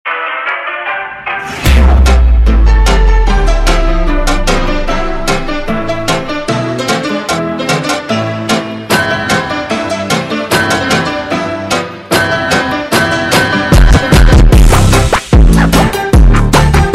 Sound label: Funk super Slowed Instrumental